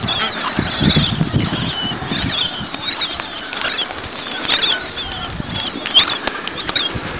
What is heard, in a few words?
adelie penguins, Antarctica